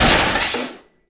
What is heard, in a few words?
Metal Debris Scattered